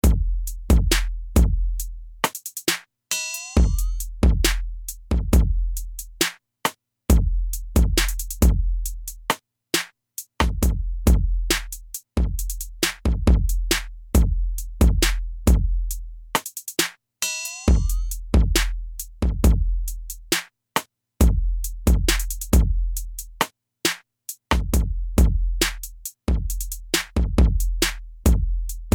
beat

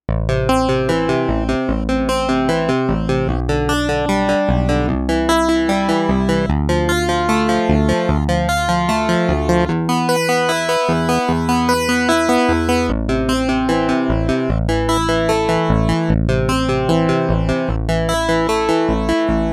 Clav

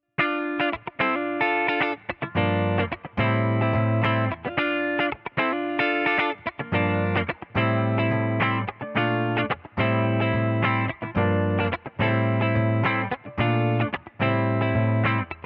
black panel

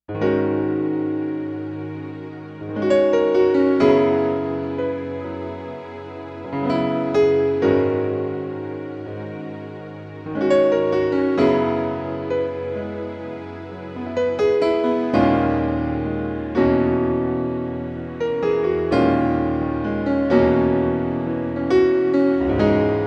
juno-di piano str; Piano+Str1; JUNO-Di Patch Demo

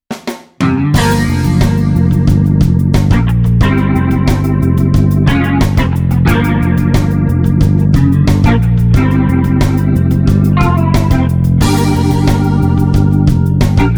patch demo

